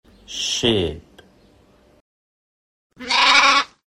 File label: sheep